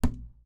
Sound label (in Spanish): Toing Flecha